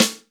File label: FUSION KIT; Northstar - New Gold VOL-1